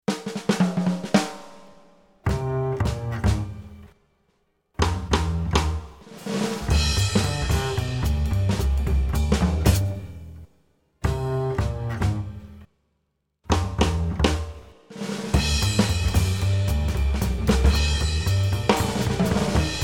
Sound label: bass drums only